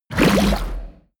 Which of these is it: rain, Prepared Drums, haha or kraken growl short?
kraken growl short